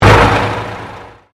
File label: Convolution Pulse